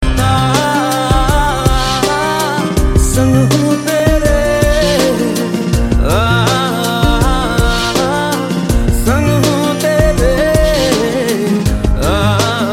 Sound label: Rhythmic